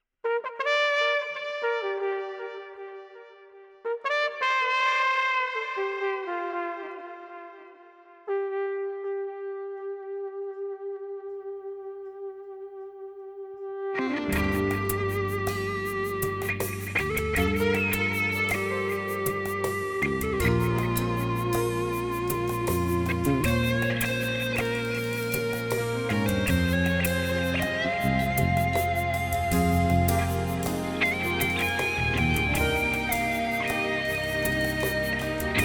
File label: gtr solo